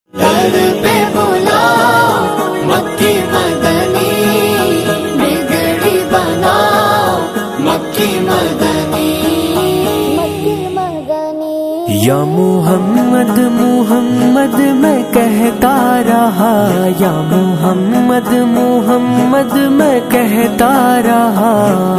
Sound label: islamic ringtone